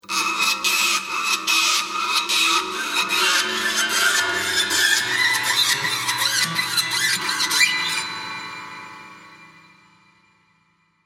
Tortured Strings, Torturous grating along piano wire; Strings (Sound Effects:Sound Design)